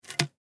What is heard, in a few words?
Tennis Racquet, Tennis Racquet Catch; SPORTS; SoundEffects-Blastwave